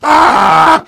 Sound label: heavy pain sharp